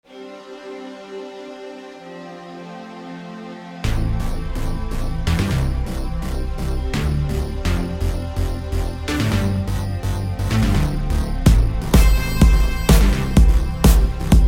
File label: splash Song